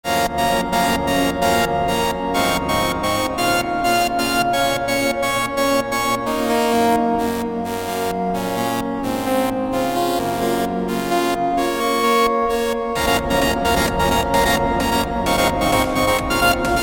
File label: trance